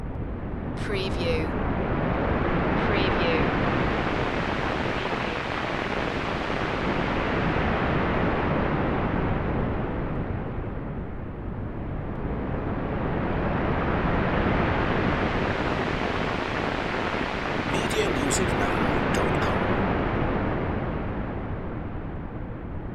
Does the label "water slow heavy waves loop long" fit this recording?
Yes